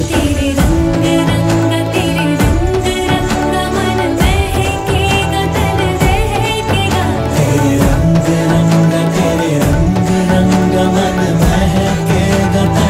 Female Version

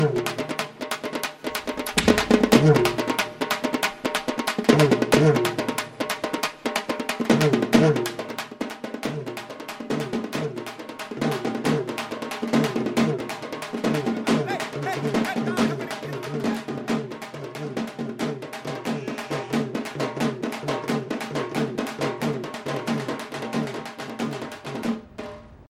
Bgm; Chennei Villagers in Mumbai

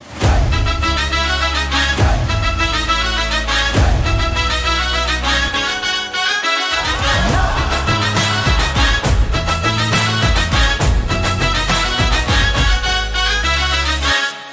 Dhol Bajaa Ringtone

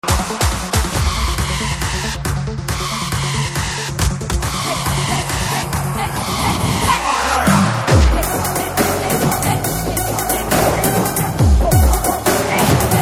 BGM Hindi; Making Of RRR

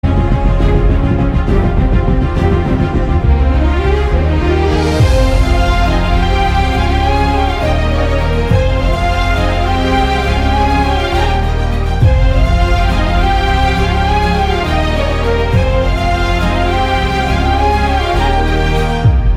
BGM Ringtone

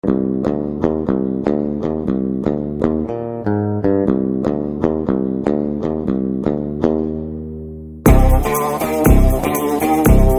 Telugu Ringtones and BGM; Disco Raja

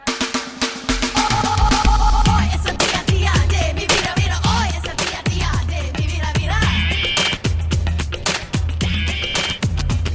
Bgm Tone; Aishus Re-Entry

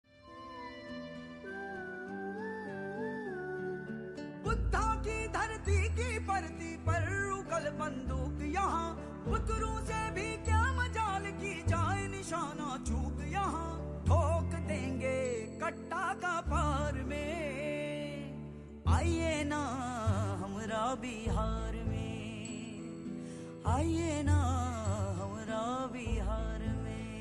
BGM Theme Ringtone; Khakee

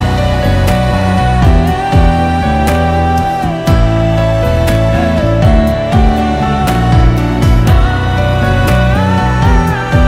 Instrumental

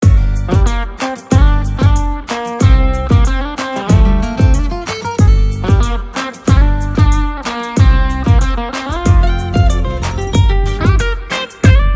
Instrumental Ringtone

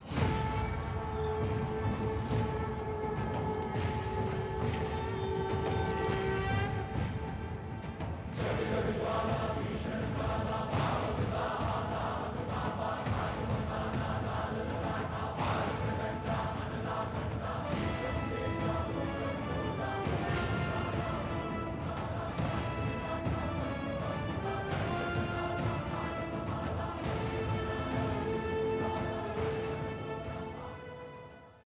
BGM Theme Music; Brahmastra

